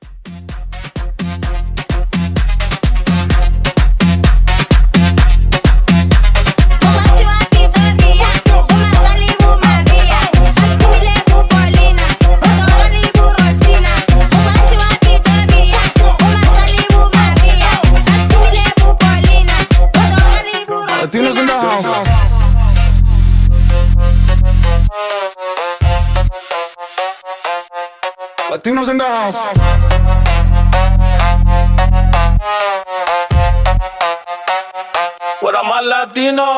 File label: Hype 128